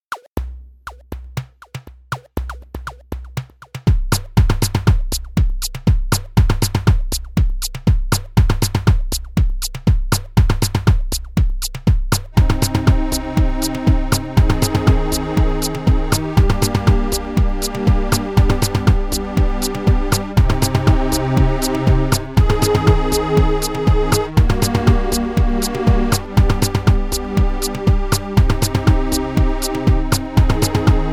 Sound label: Chordion; Egypt